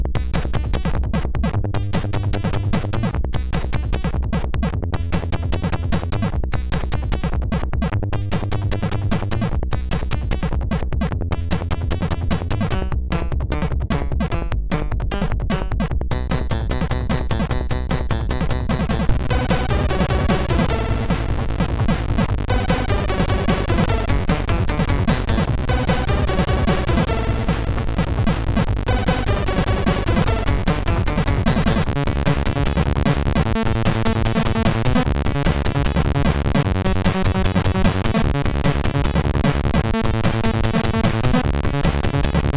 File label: Rain sid MOS6581